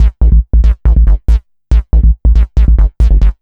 Synth Bass; Basses

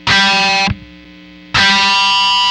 Gtr; pitchshifter PSI

